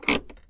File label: pull e brake, car